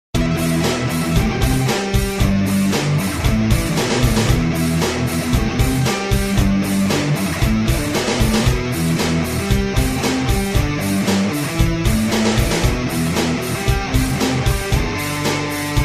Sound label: Mega Android Tune